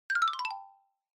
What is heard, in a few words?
Xylophone Slow Short 4 Down